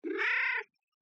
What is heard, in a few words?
happy Meow